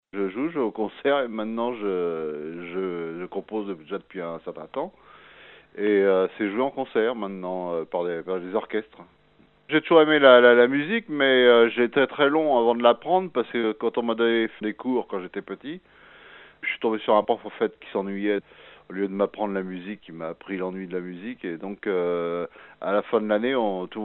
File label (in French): musique